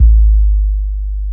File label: SUB BASS A2; Explosive Jungle; USB Soundscan vol.05; AKAI